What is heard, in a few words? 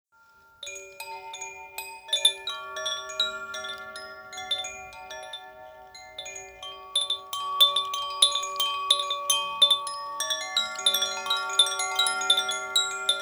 7 cakra chime; cakrova zvonkohra krcna 5 cakra